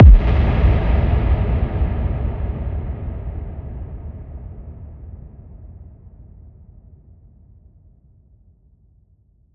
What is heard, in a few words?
Fx Impact Big